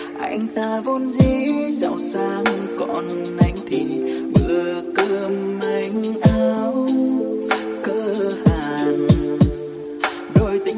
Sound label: Lofi; nhac chuong